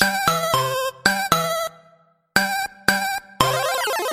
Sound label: 8bit